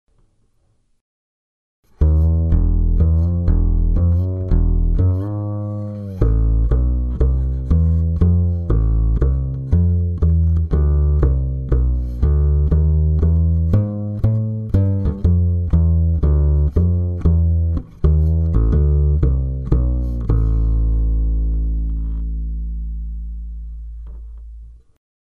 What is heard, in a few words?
Bass Pickup